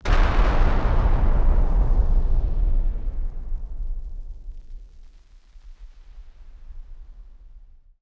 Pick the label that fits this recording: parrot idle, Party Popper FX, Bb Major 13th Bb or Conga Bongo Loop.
Party Popper FX